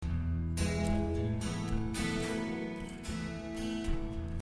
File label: Plucking E,A,B,A 57